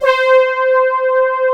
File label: JX10, PAD; Alpine Volksmusik